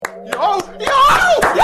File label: clapping